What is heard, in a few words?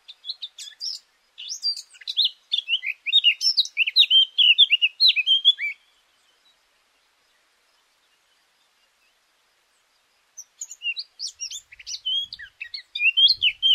blackcap